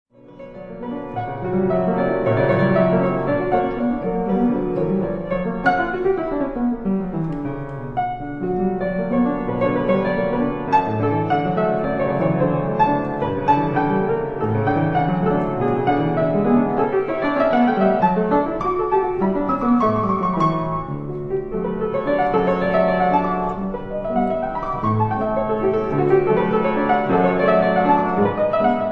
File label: Etudes for piano B minor